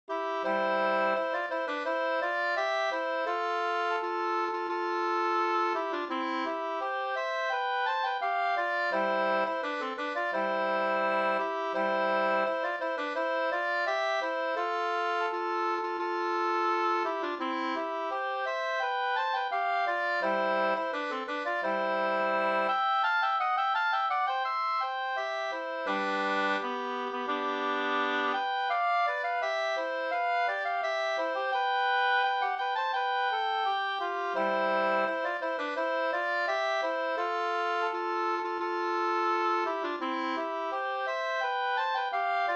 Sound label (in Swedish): brudmarsch; Delsbo brudmarsch